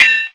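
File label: KAINGK, percussion metallic; Rarefaction; Poke in The Ear With A Sharp Stick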